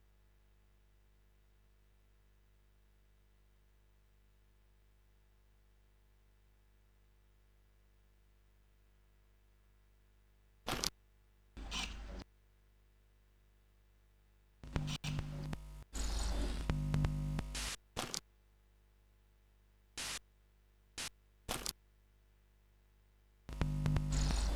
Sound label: stereo loop; rauschpartikel